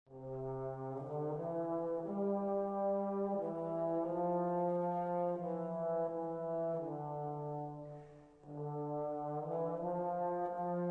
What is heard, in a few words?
Brass Choir